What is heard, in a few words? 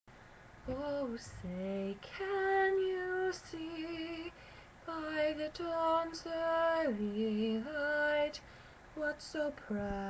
alto 1st half